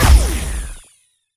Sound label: plasma rifle; Allegiance